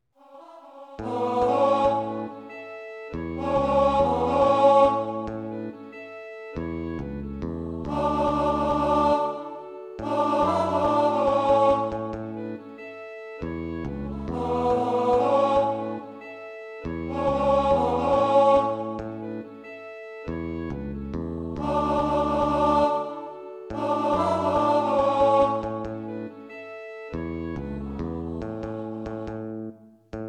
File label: Tenor